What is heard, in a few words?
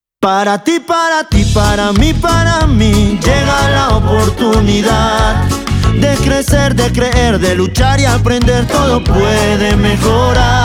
JINGLE